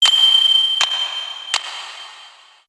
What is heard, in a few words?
puzzle lead up